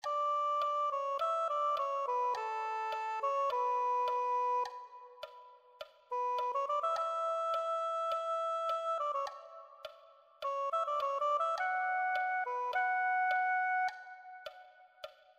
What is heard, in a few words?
soprano saxophone